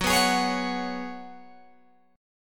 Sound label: Gb Suspended 2nd Suspended 4th Gb, down, Guitar, Open G